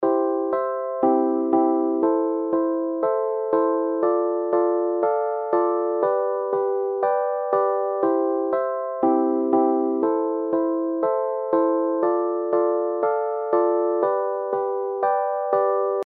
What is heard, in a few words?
music